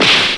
slap kung fu; Crash Bang Boom

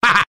ha ha, Voice ooh ahh

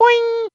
boing cartoon; ooh ahh

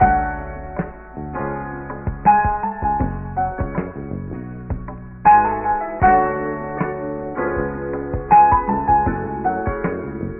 piano, phonky loops

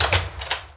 door close

